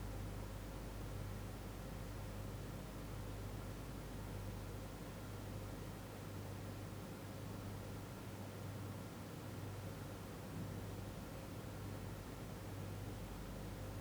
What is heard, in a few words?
indoor small room